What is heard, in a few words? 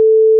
tut; Habegger loader game